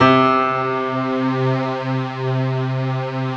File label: PIA Piano String; Sound & Vision; Gigapack I CD 2